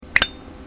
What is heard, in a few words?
Zippo orginal, lighter